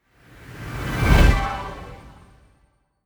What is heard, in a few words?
whoosh